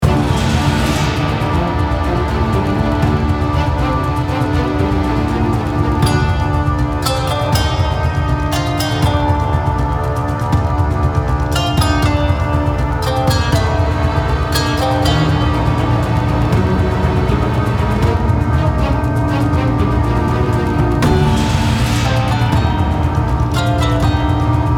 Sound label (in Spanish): Hielo Guerra